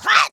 slide

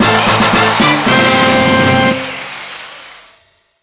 UI Grand Champ Advance Success

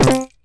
jump

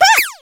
Throw Letter; Candyfloss

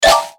Slide; Millennium Tree

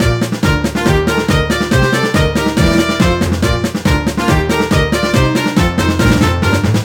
Bgm skill; marshmallow and macaron

